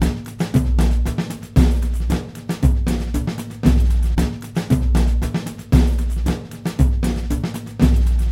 Latino Line Dance

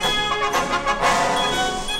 Marching Band Drums

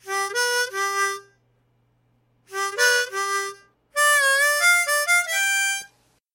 Harmonica

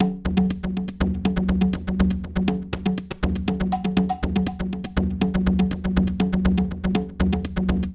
Hand Drum with Delay

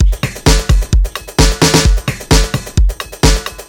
Industrial Rock 130

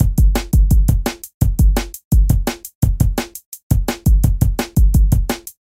DnB Loop

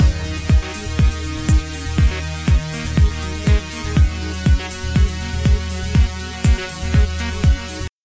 Hard Detroit House

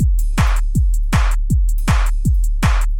Hardcore Tech Beat

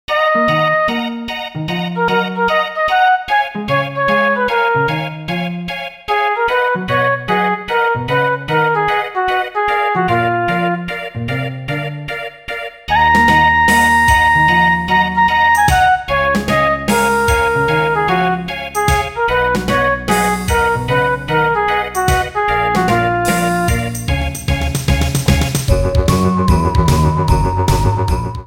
Midi

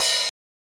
HiHat

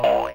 Game Jump Sound

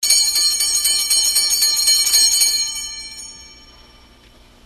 Hand Bells

